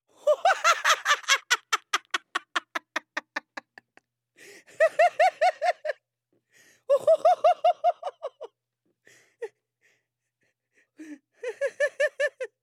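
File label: female laughter sounds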